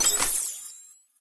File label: objective panel expand; ui